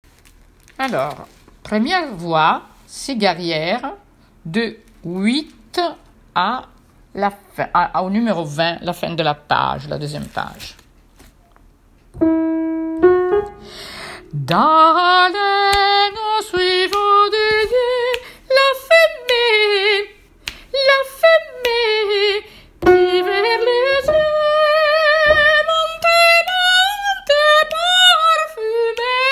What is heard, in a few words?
soprano; cigarières